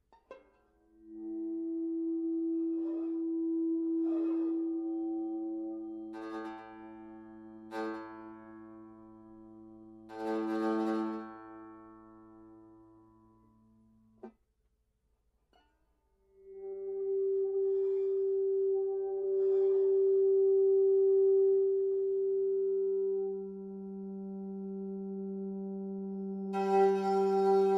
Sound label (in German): e-bow, banjo